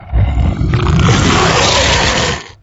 Dungeon Ghoul Attack

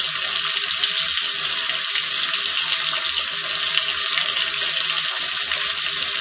fountain

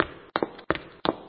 run, zvuki shagov

